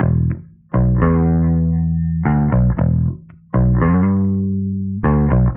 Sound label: bass, Loops